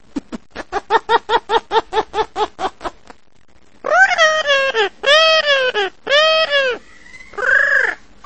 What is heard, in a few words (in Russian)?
popugaj umeet krichat po raznomu